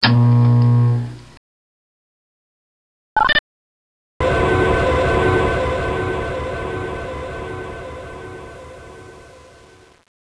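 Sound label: elevator up; svencoop; sectore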